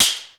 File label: AMB P, HALL KITS; Crypton - DrumBase5000